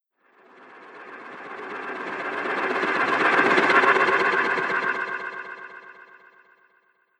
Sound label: Broken Flyby, whoosh